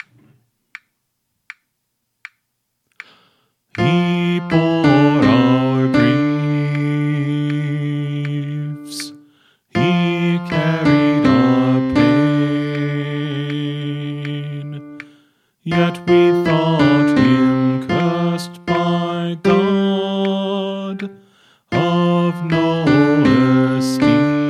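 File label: Song, Choir Practice Tracks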